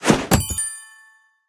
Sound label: Book Appears